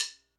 Sticks Low Pitch

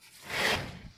Whoosh Medium Tone

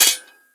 Impact Shatter

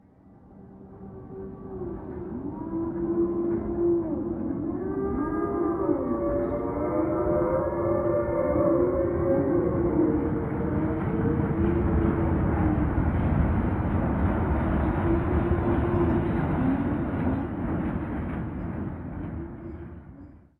Haunted Stagecoach

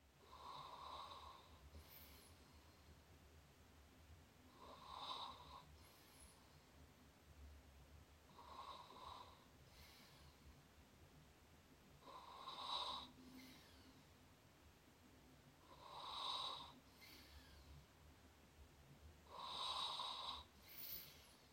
Man Sleeping Breathing Sound

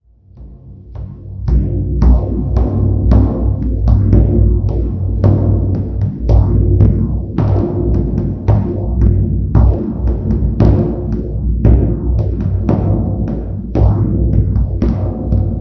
Shamanic Drum

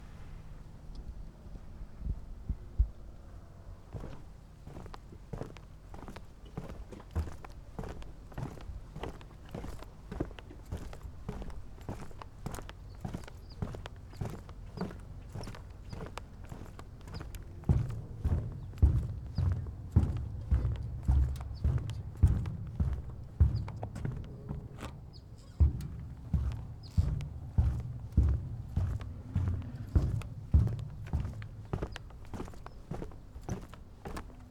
Bridge Boot Footsteps

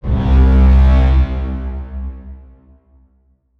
Dirty Siren

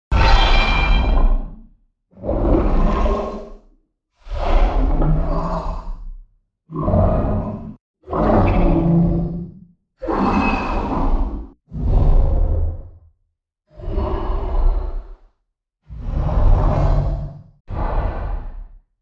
Dark Tonal Magic Whoosh

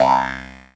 Poing On C2

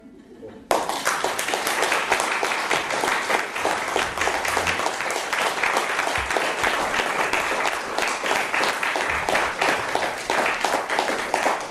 Polite Applause After Amusing Song

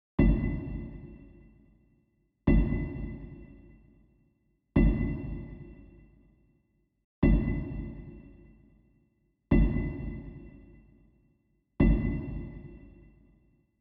Deep Hammer

